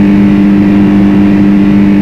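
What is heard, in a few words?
etypec off mid,car engine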